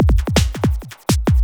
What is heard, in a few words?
Beat, Drums & Percussion; Electrocution; Beats